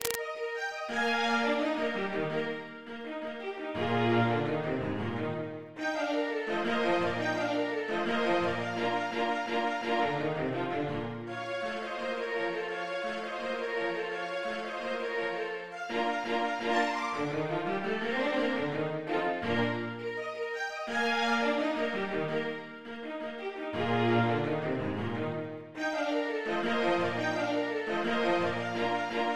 Haydn String Quartet in Eb major

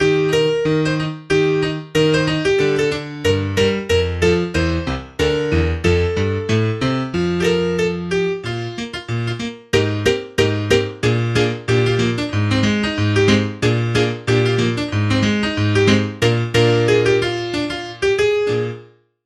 Piano Intro

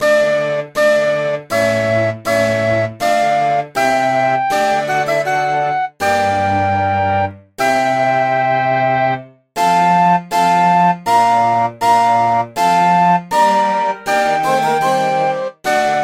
Medieval Music

